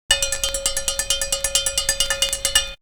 Percussion; Coldcut's Kleptomania VOL1

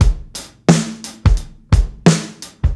87 SWINGY, drums; Basement drums vol 2

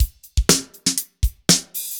120 ROCK, drums

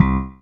ELEC BASS LO

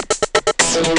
inst wave; Rubber Chicken Software Sample Bank

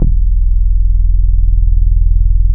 SEQ, DISCO, Bass Analog & Synth; USB Soundscan vol.12; AKAI

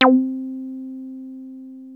303 A3, TB 303; Hollywood Edge; Giorgio Moroder Rare Synthesizer Collection

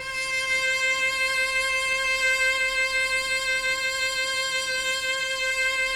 CHAMB CELLO; Sonic Reality; Vintage Timetraveler

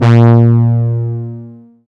SYN, analog

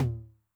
HI TOM MOD Noise, 909mod; classic machines; HT - HI TOM

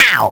Drumroll; SNES; DynamiTracer

